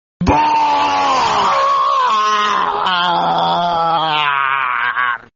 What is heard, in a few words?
swedish bork scream